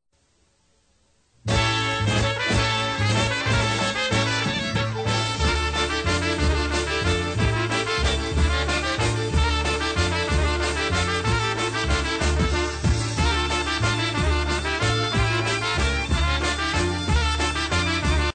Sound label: COUNTRY MINOR OBEREK, polka